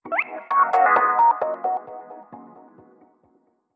Samsung Galaxy S Hoppin Shutdown; Samsung Galaxy S Hoppin (SHW-M190S) (SKT Carrier) Shutdown

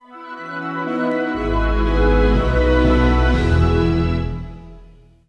Windows 7 Media Center; Windows Never Released Soundpack 2

